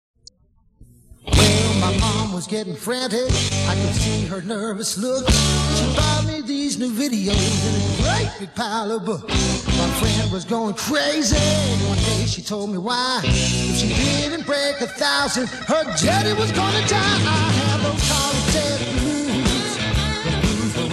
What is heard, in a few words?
college test blues, guitar